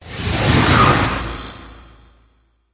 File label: worm